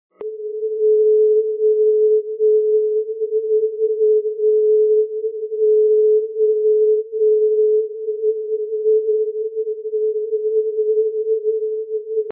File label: 0.742MHz